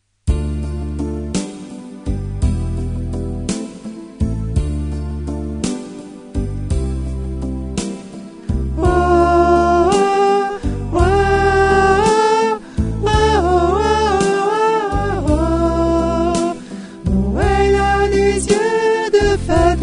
noel a des yeux de fete SOPRANE

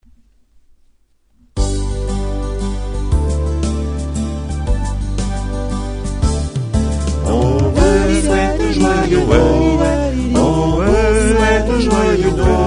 noel on vous souhaite tutti

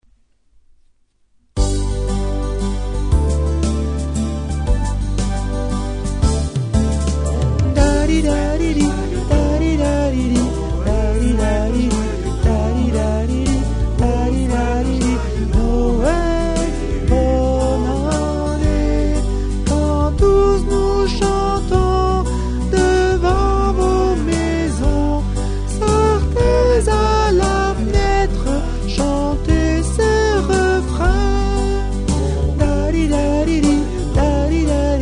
noel on vous souhaite alti

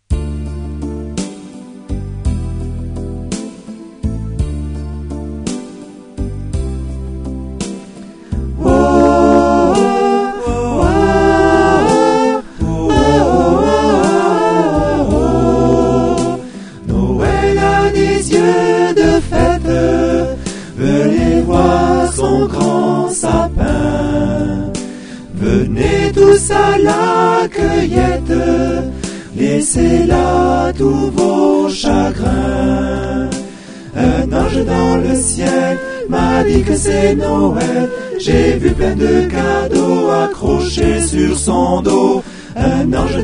noel a des yeux de fete tutti

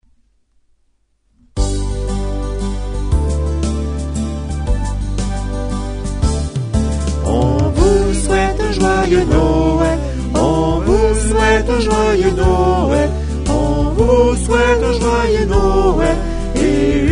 noel on vous souhaite soprane

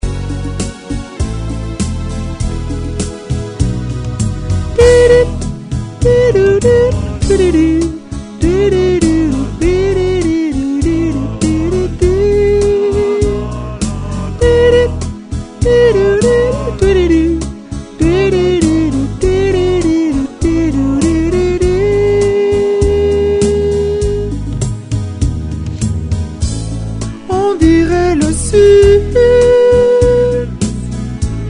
soprane

